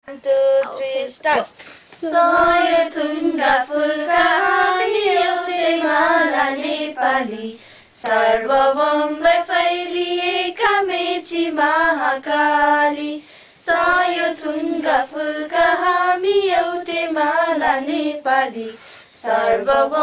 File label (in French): Nouvel hymne national népalais